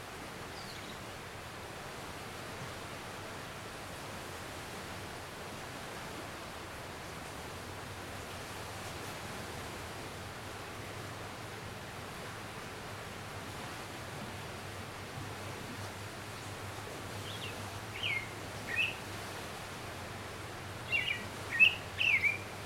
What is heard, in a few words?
ISLAND AMBIENCE LOOP; AMBIENT LOOPS; CANA ISLAND; Interactive Lighthouses